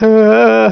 ohh ahh; wanted

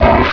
miss, grizzly; wanted

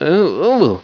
ohhh; wanted

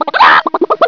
chick collect; chicken; wanted

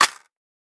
latch

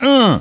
pain

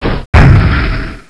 cannon fire; wanted; weapons

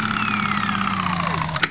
gat spindown, weapons; wanted

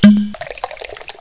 small medkit; wanted; items

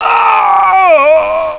die, cowboy; wanted

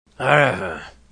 arghh; wanted

